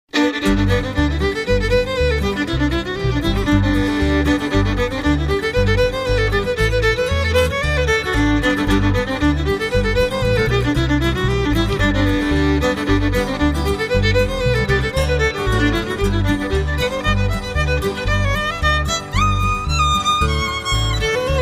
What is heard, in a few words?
twinkle little star